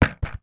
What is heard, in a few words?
toy helmet plastic drop on carpet floor; sound effects one